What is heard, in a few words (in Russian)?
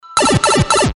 8 bitnaya sms